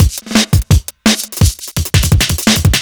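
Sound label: Drum and Bass Beat 170, drumnbass 170bpm; musicradar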